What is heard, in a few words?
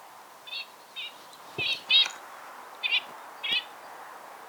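garganey spatula querquedula; utok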